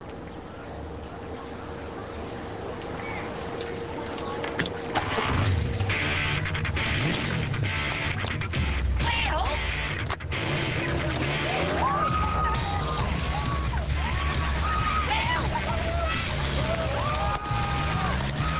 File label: motorcycle